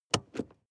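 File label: sports car interior handbrake release medium; verkehr-transport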